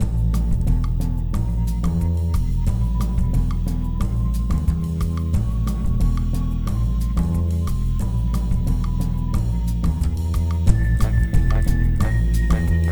Question Music; Jackbox Games; The Jackbox Party Pack 5; YDKJ

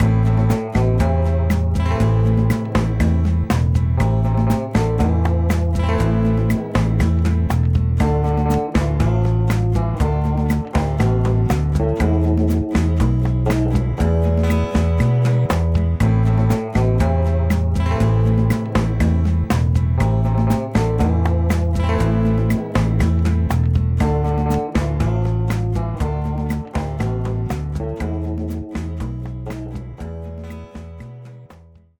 Post-Question Loop; Jackbox Games; The Jackbox Party Pack 1; YDKJ

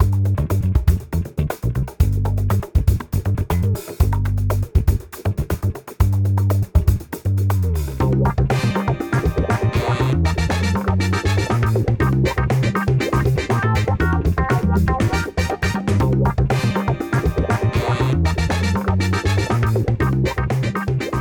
Ask Countdown Music; Jackbox Games; The Jackbox Party Pack 1; YDKJ

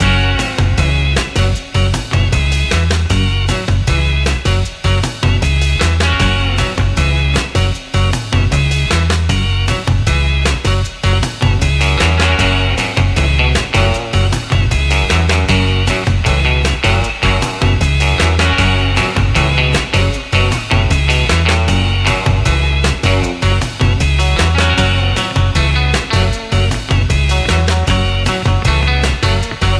Timer Music; Fakin' It; Jackbox Games; The Jackbox Party Pack 3

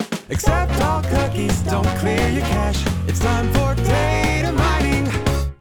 Bumper; YDKJ; Datamining; The Jackbox Party Pack 5; Jackbox Games

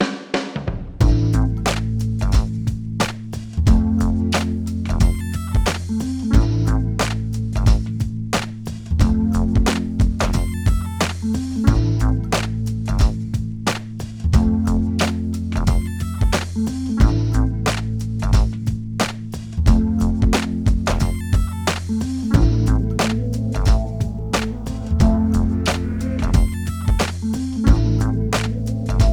Write Loop; The Jackbox Party Pack 5; Jackbox Games

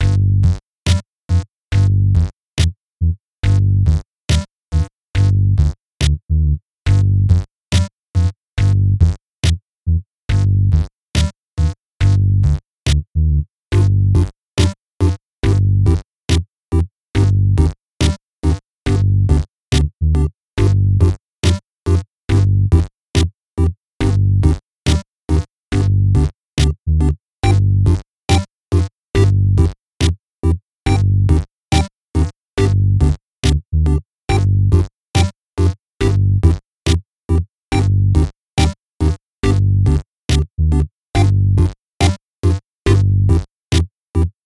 Background; Bomb Corp.; Jackbox Games; The Jackbox Party Pack 2

